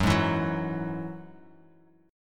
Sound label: Gb 7th Suspended 2nd Gb; strummed; JGuitar